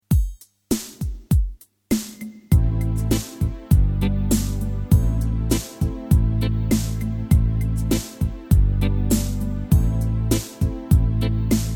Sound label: Backtrack penta Am